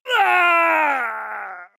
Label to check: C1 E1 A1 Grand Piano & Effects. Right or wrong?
Wrong